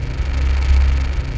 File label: jump thruster hover; startopia